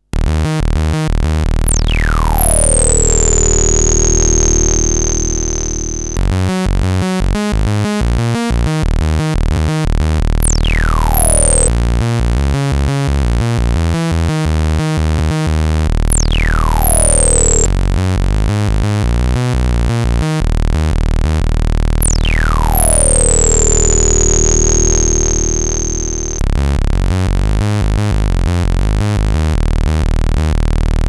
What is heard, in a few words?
S100F Bass